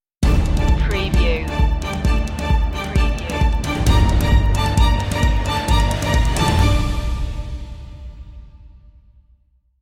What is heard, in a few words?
alive stinger